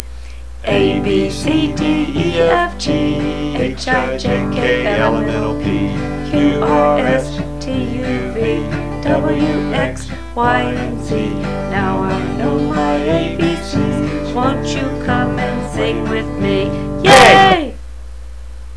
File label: abc song